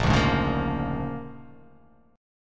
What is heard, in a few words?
A sharp 7th Sharp 9th A sharp down